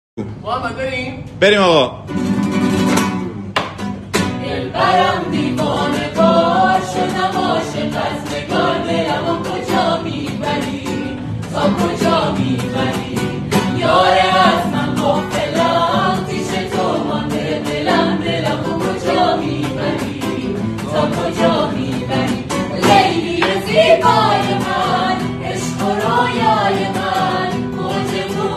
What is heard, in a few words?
music hamkhani gorohi